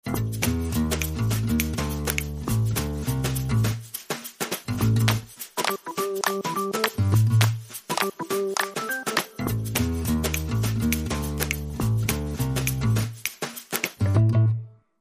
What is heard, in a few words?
Dancing Bass Notes